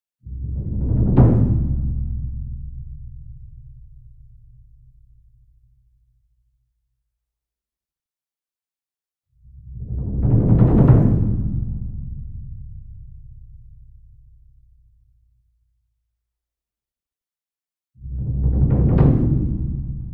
SINGLE DRUM Gong Roll Soft Beater Medium, Cinematic Strikes; boom library; Cinematic Strikes Construction Kit